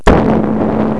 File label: explosion